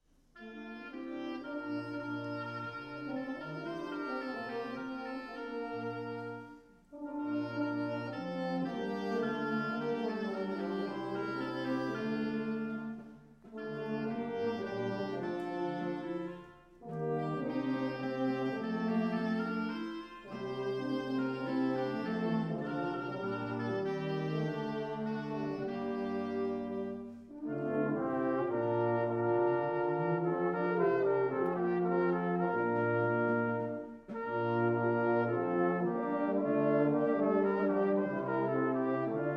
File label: Courtly Airs and Dances Basse Dance